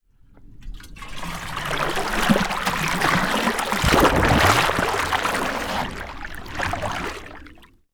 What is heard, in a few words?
Water; TOOLKIT vol.2